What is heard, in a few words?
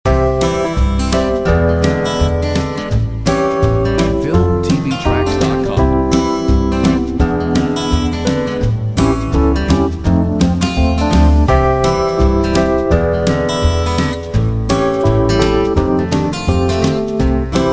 Smooth ride, Uplifting Inspiring Royalty Free Music; Filmtv-tracks